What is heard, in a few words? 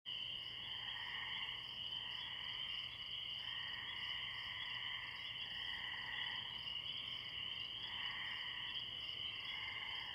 Frogs Swamp